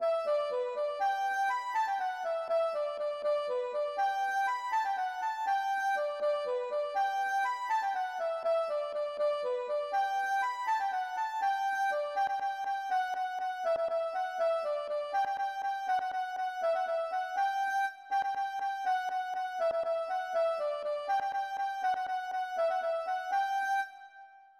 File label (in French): bourree Droite; Droite Du Pays Fort